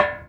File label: Bust, percussion; HOUSTON; Hermann Park Bust of Jose Marti